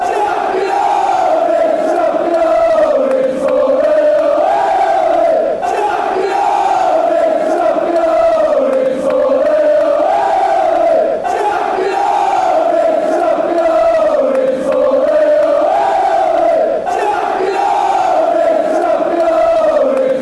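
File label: championis ole ole ole, football chants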